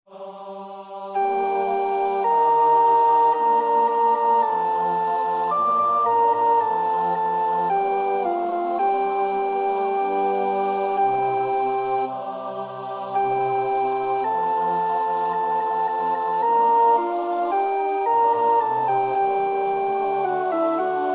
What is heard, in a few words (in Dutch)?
sopraan, koorpartij oefening; Schütz (Heinrich); Matthäus Passion SWV 479